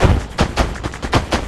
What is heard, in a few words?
LOOP, 160 BPM, Drum & Bass